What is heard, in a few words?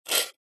foley rock concrete knife blade scrape scratch